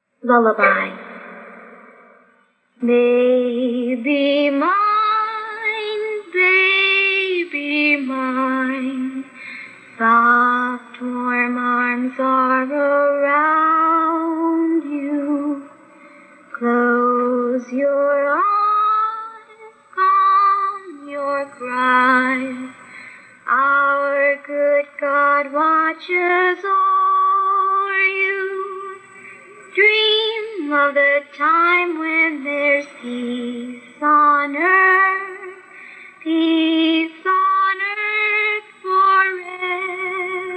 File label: Lullaby